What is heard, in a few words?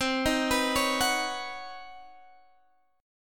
B Major Add 9th C, chord; Badd9/C; arpeggiated; JGuitar